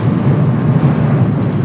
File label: Door Large Metal Loop; Doors